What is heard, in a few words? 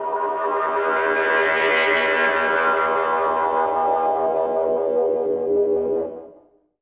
Musical FX; Paralysis; Zero-G Smoke